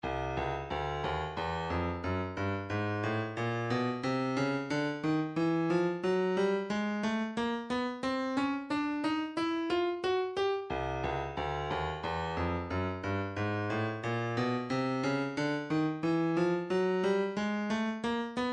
129 hihat, midi

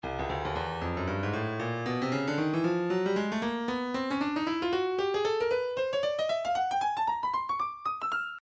stylus breakbeat midi files 115 bpm swing; Swing A Ling

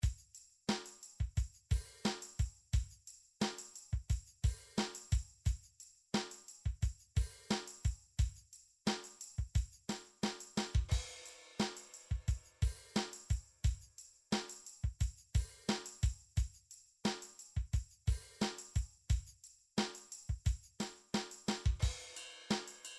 drum pop; Drum Midi Files Collection

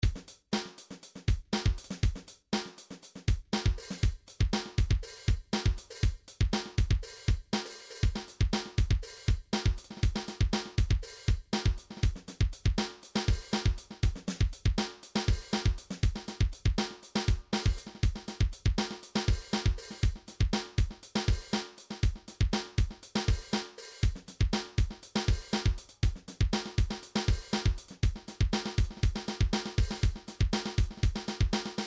midi drums percussion; Midi Vol 4

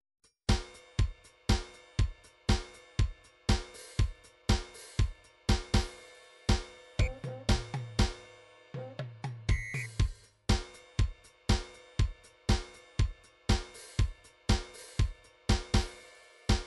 drums, midi drum patterns

